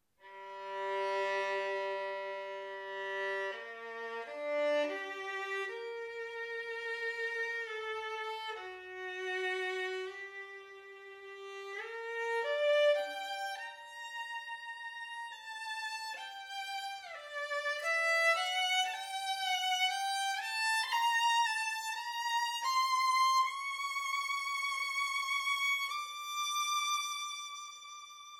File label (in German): brilliant solistisch klar